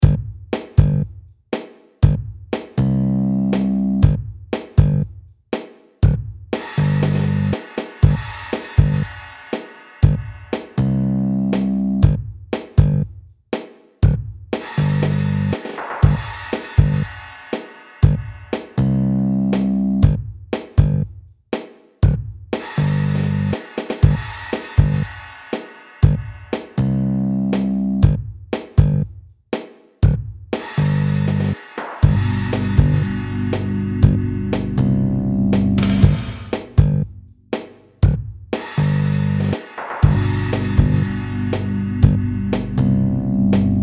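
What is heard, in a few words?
G 120 guitar groove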